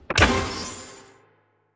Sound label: crane box opening; ringtone; Mini Militia